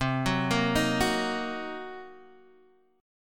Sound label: C Minor 7th Flat 5th C down Guitar DADGAD